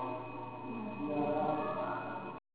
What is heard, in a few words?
noise reduced